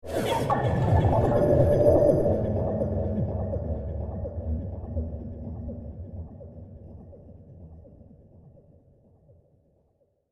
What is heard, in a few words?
High Tech Movie Trailer Ambience; Scary Soundscape Sound Effects; Andrew Fleming